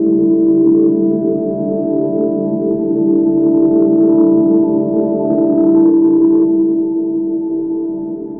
Ambient FX, Ambience FX

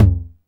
Tom Mid, TR-909; Giga Samples Collection

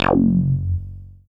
SWEEP BASS; Masterbits; Dance Trax

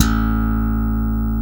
BRITE BS A2, FINGER BS

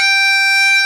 CHANTER G4, Bagpipes; E-MU Formula 4000 Series Vol. 4; Earth Tones

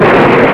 THNDR CRK, FAIRLIGHT CMI IIX, WEATHER

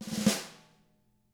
SNARE ROLL

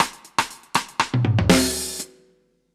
Dub Drums A Dry 120bpm; musicradar

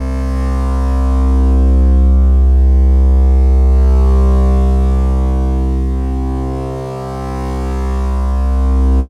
syn c, Chillout Synth Atmos

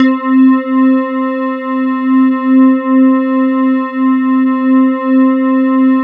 E-HARP, DX HARP; Wizoo - Powered DX

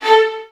VN FX, Virtuoso Strings

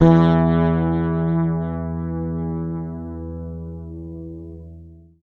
E, Bass; Sampleheads; Will Lee Bass Library

